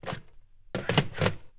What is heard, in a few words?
Phone Pick Up Hang Up